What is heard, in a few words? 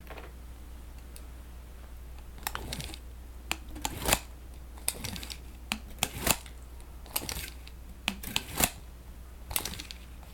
Noise Objects